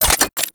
Pod Shotgun Mech; Warframe; Weapons; Tenno

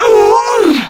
Death, Female; Warframe; Grineer Female; Battle Barks

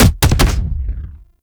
Scaldra Pistol Alt Fire Punch

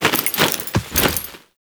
parry finisher foley; Enemies; Drifter; Warframe; Duviri; Dax

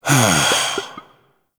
Exhales; Warframe; Entrati Labs; Cavia Rank Up Scenes; Tagfer

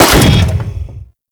Saw Blade Launcher Shot; Warframe

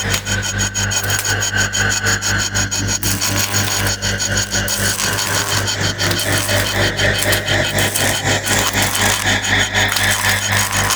Spinning Energy Battery Loop; Warframe; Ambience; Entrati

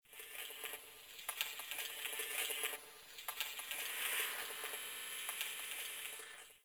Data Texture J; Warframe; Venus Open World; Venus Solaris United

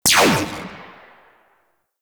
Spear Gun Tail; Warframe; Corpus

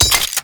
Pistol Mech; Warframe; Scaldra Pistol

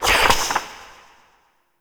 Shot Mech; Warframe; Noctua; Weapons

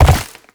land ice; Movement; Warframe; Uriel

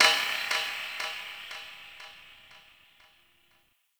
Ship Metal Knock; Warframe; Corpus Ship Remaster

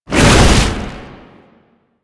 Jump, Foley; Enemies; Warframe; Proto Infested; Babau

